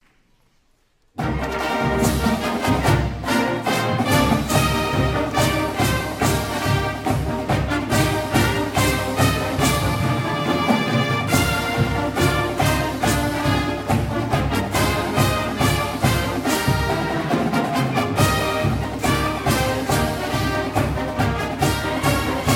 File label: fight song, marching band; gvsu; twirler audition